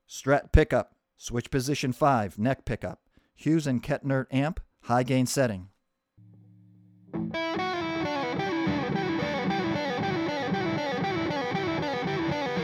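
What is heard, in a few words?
strat neck high, guitar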